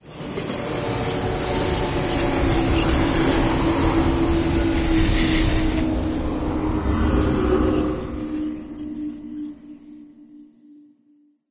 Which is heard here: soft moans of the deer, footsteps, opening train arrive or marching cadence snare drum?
opening train arrive